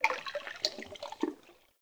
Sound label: Under City Cavernous Water Lap Rock